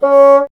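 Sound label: wind bassoon C#; Miroslav Vitous - Solo Instruments VOL-1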